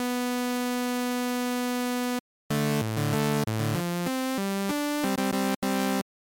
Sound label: Sawtooth Wave, Synthesizer